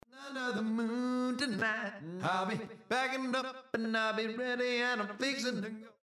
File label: acapella